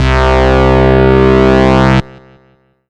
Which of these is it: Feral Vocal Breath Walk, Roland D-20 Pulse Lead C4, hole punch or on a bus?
Roland D-20 Pulse Lead C4